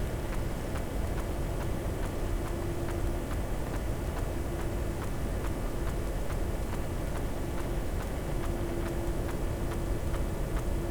cachalot positif, Physeter Macrocephalus

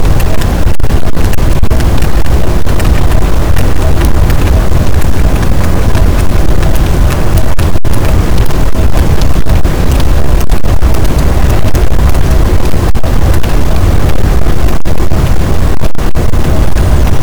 cachalot positif, boat, Physeter Macrocephalus

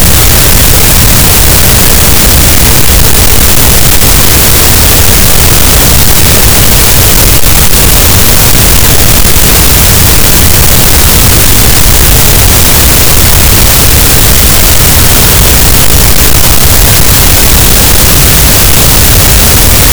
cachalot, Physeter Macrocephalus, gaussian noise

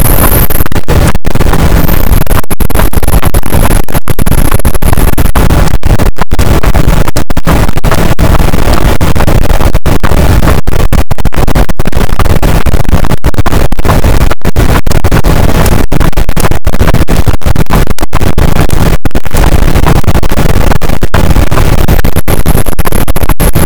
cachalot positif, boat; Physeter Macrocephalus

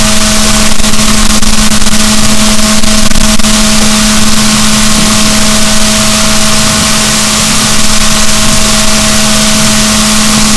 rorquals, boat, Balaenoptera Physalus; SNR 6dB

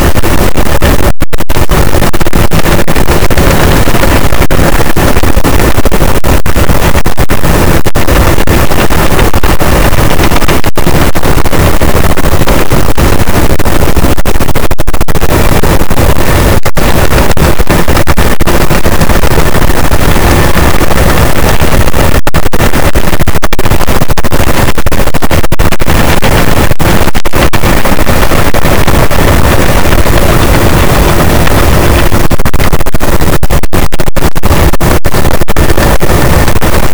cachalot, gaussian noise; Physeter Macrocephalus

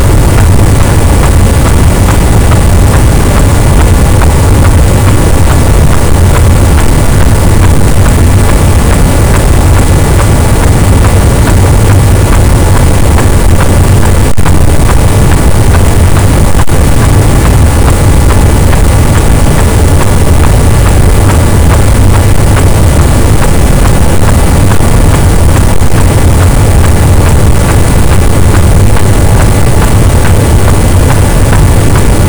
cachalot, boat; Physeter Macrocephalus

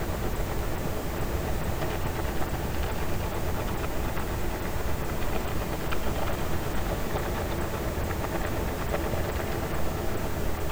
cachalot negatif, Physeter Macrocephalus

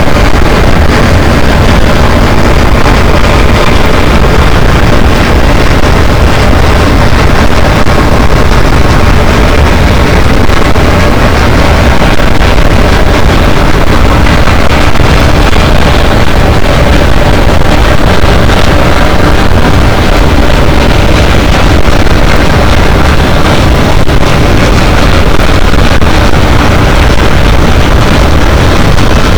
cachalot, boat; Physeter Macrocephalus

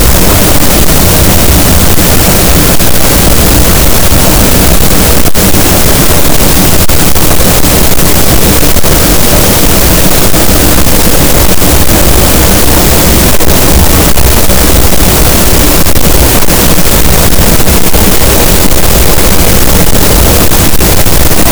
cachalot, gaussian noise; Physeter Macrocephalus

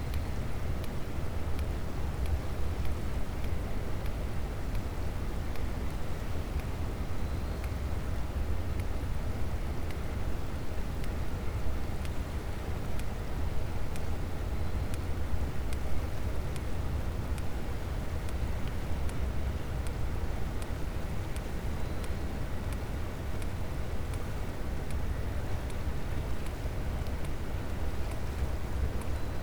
cachalot positif, Physeter Macrocephalus

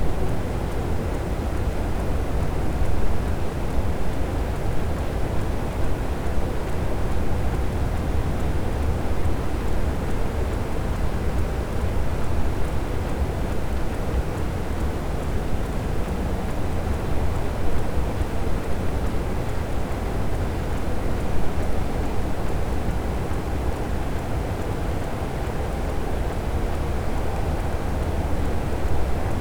cachalot negatif, Physeter Macrocephalus

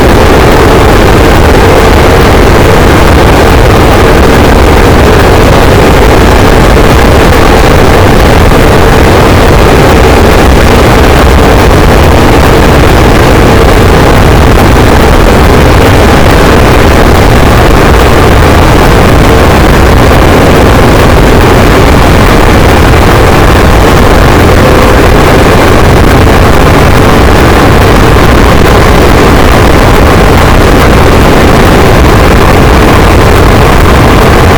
cachalot negatif, boat, Physeter Macrocephalus

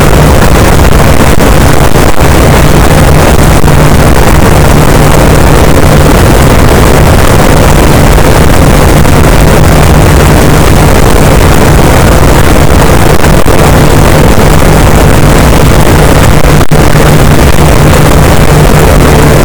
cachalot negatif, boat, Physeter Macrocephalus

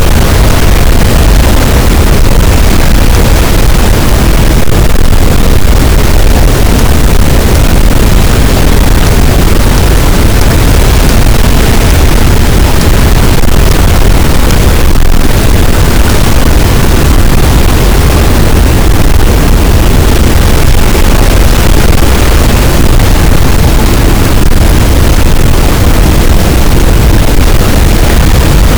cachalot, Physeter Macrocephalus, gaussian noise

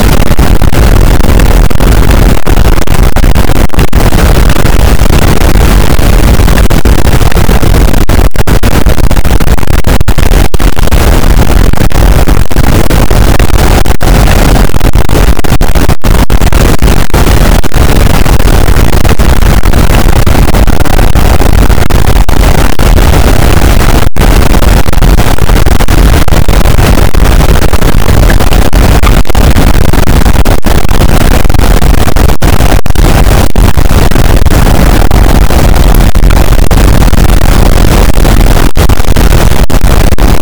cachalot positif, boat; Physeter Macrocephalus

